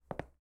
Walk; portal quest android; Mother Nature

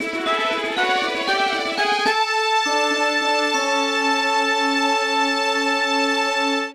Stadium Cup Final Winner Fanfare

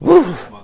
Pig Relieved; Angry Birds Space

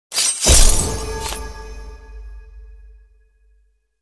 light sphere destroy

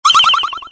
powerup sound

